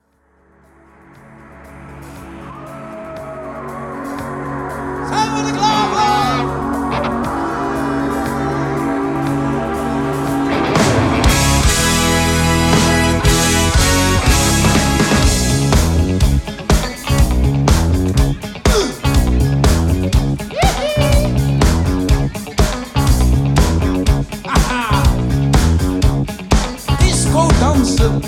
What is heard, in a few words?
Snap